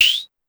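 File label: Shaker Up, Drum Machines Clavia DDrum-AT Pitch Envelope; 300 Drum Machines; Pitch Env Various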